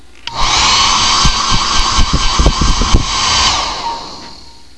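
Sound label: hair dryer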